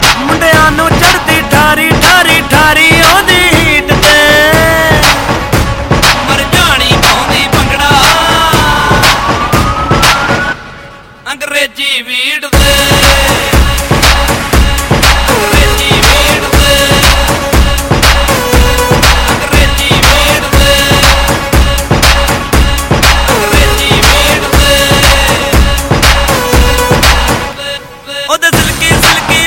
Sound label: ringtone